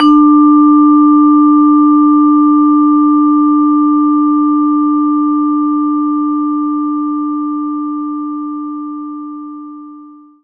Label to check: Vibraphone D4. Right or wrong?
Right